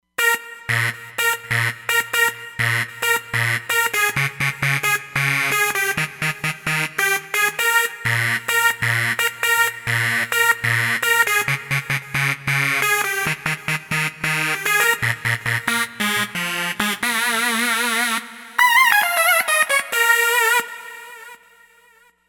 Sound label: Wire Sync, Roland Fantom XR